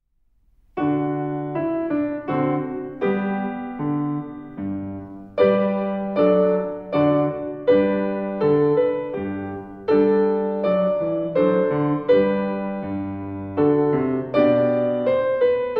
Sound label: Minuet; Med Tempo; Step by Step 1B